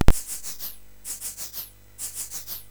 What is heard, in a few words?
FX, MOS6581R3; sid; Software House